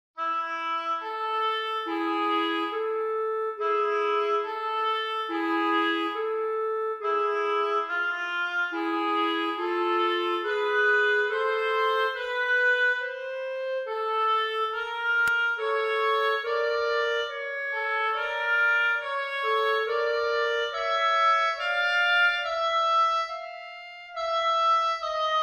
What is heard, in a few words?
Canon; Beethoven; Hess229